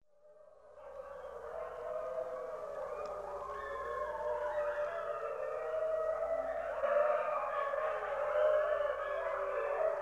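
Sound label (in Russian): волки воют большая стая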